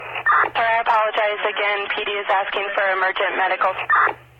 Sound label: death; Ready Or Not